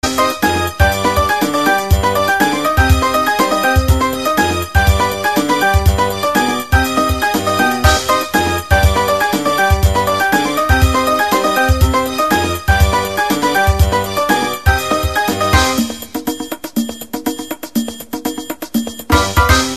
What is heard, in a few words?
moto m ringtone; moto m 2022